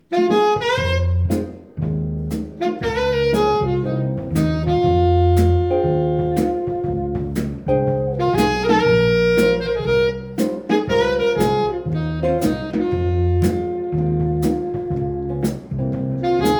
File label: Blues walk